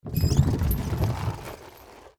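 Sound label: siege initial movement; Onager, Ballista, Ram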